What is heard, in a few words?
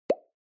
Onoma Pop Low Dry